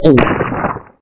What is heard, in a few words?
swallow, fox male